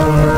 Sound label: zap organ; E-MU Formula 4000 Series Vol. 2; Dance Hits